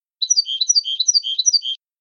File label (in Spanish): mecida, campero